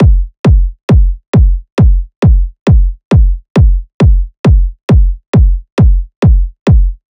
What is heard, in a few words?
Kick Loop 135, Techno Drums